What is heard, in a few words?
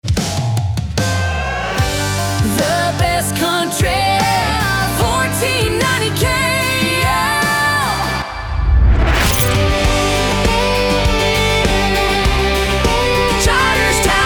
Country Jingle, radio; Kasper Kreative Jingles; RadioLinx Broadcast Marketing